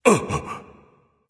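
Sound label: nonverbal surprise; smite; voicepack; ullr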